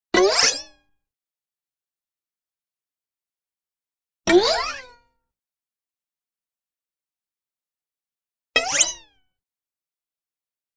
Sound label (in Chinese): 啵 咻; 综艺类音效